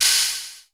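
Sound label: analog cymbal; 90 sSampleCDs; Crypton - DrumBase5000; ANALOG KITS1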